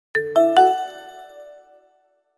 Nokia Notification

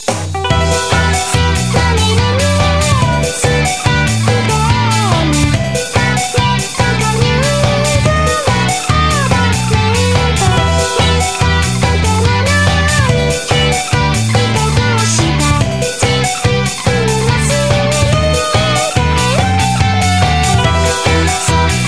Disco

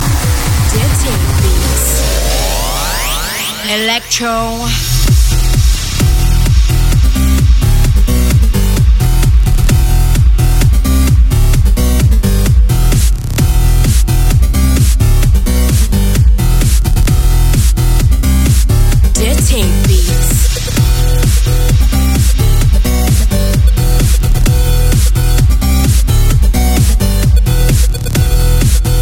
Dirty Beats Electro

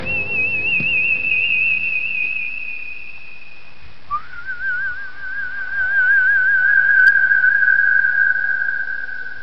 whistle; Shadowfax

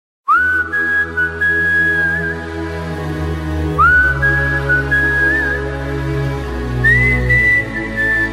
Great Lovely Flute

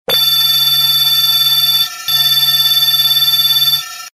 Sci-fi Bell

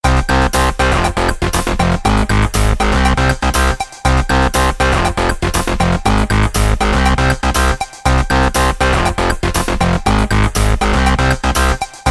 Casio

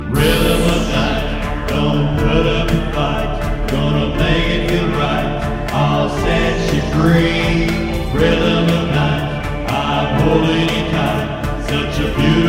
Night Rhythem

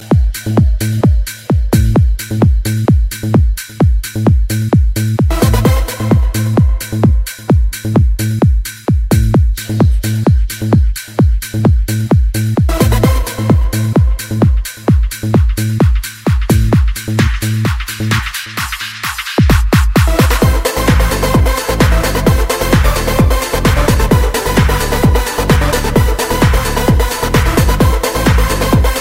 Bass Remix

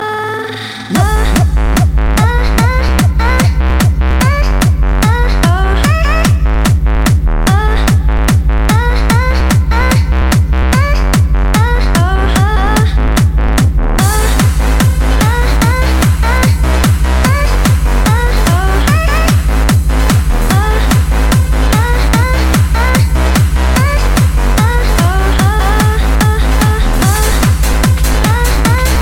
Ah Ahh Ahhh

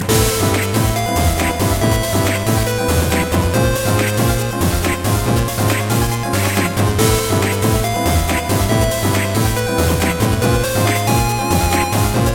Drill Crush

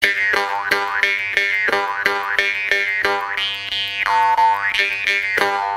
Strings

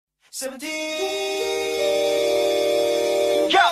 Text Tone

